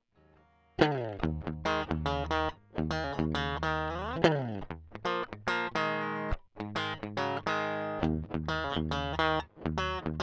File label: Crunchy Blues